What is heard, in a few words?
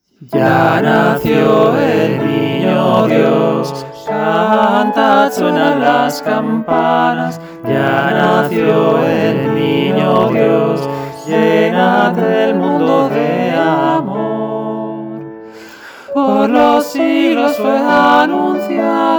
Tutti; Il est ne le divin enfant